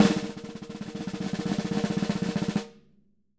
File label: SN ROLL, SD ROLLS, Living Drums